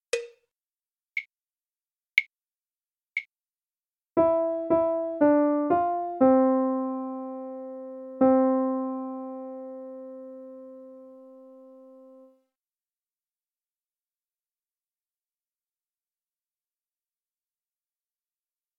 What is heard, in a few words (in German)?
Melodie